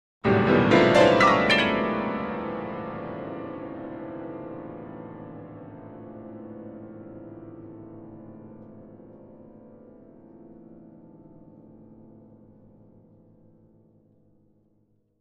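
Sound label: Grand Piano Ascending Chords; keyboards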